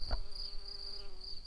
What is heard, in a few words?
Pascuorum workers, bumblebees